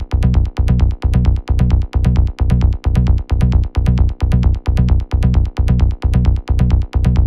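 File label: Bassline C 132 BPM; Synestesia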